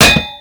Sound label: Metal Pipe Drop; Ambience; Props; Warframe; Nineteen Ninety Nine